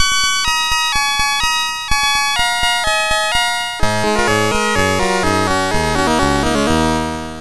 sid MOS6581R2, GAMES; Changs Adventure

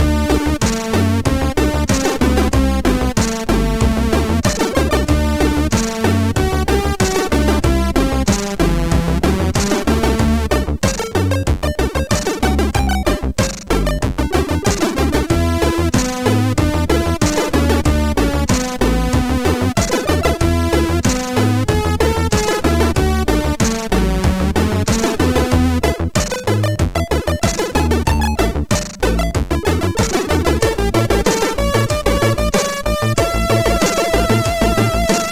Sample, MOS6581R4; sid

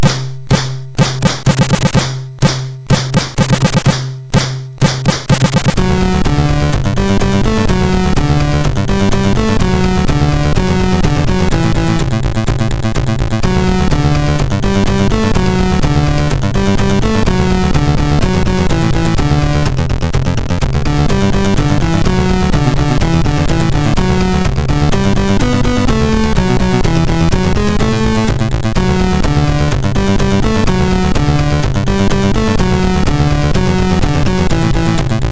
sid, MOS6581R3

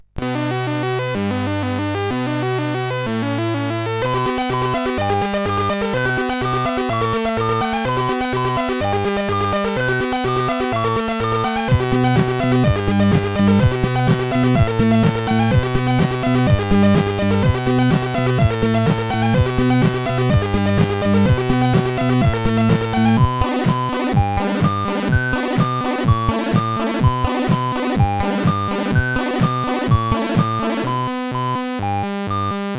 Realtime, CSG8580R5

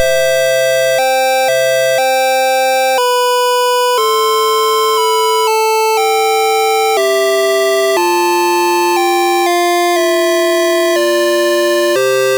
Flute Room, MOS6581R3

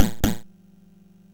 sid MOS6581R4

